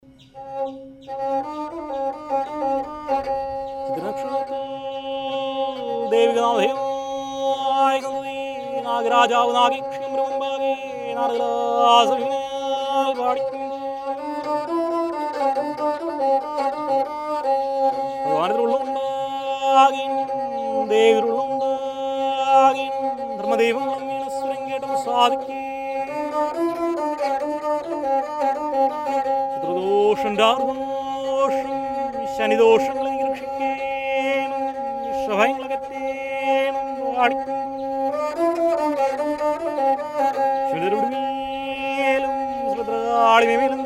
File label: PULLUVAN PATTU, LANGUAGE MALAYALAM